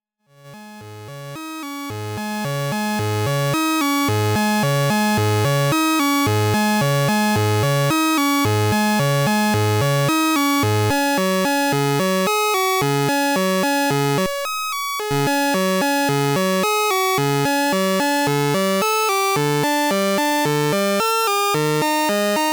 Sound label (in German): ACL Variable Sync VCO synchronisiert mit VCO2 hard sync soft sync Modulator ist Sägezahn